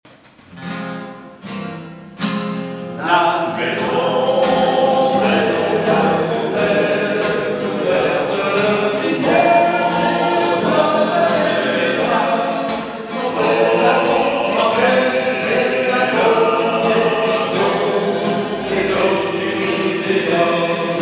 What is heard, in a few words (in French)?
5 voix égales; harmonisation et arrangement